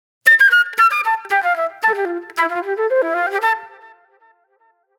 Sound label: Disco Flute